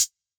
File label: Closed Hat, retro house samples, Drum Hits